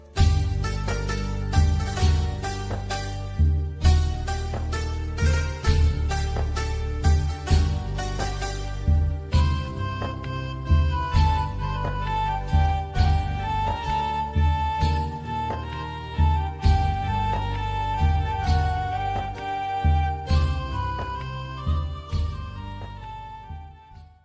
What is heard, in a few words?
bollywood music ringtone